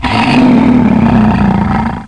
monst; ignition; BOOST

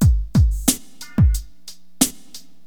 Drums loop, HipHop; LiveAct Pool 1

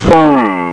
foom; Multimedia Toolkit

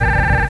Engine Room shields; Star Flick

